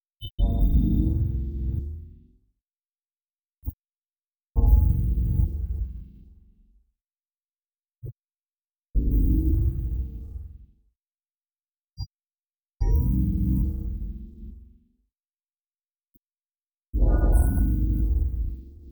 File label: Whoosh Low Metal; Whooshes